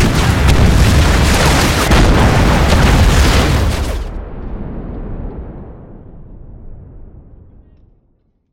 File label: Explosion Series